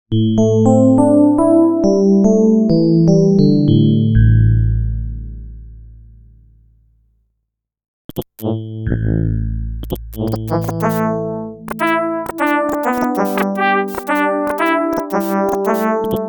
yamaha ex5r sounds, fdsp ep pickup, dx7s raw and ep pickup